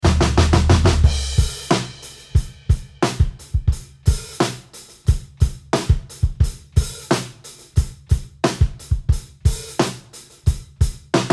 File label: Drum Sample